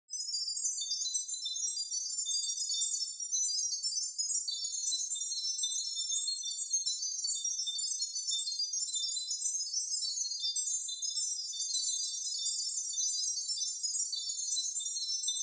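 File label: Wind Chimes Sytrus Sine Harm Chorus Reverb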